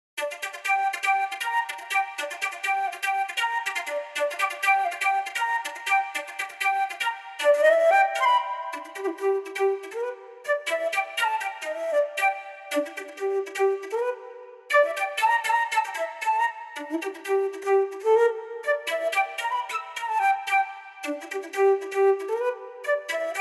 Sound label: solo flute; 3 pieces for solo flute